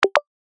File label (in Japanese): メッセージ